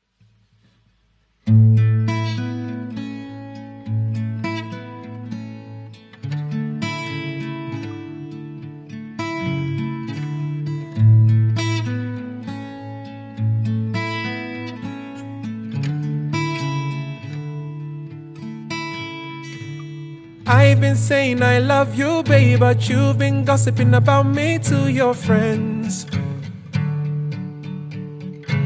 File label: Guitar Acoustic